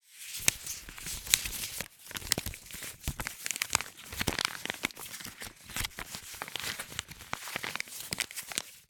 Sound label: foley money note 5 pounds old paper handling